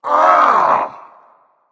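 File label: nonverbal ugh; smite; voicepack; merlin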